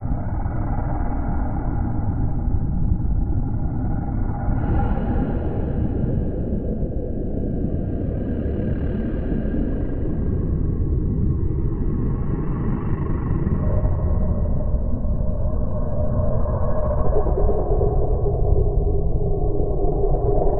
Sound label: Drone; day of the zombie windows gamerip 2009